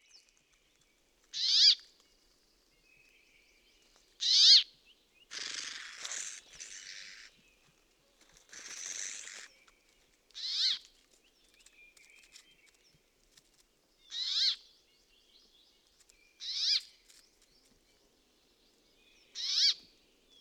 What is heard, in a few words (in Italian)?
Peppola Fringilla montifringilla; Tommaso Bergman; Xeno-canto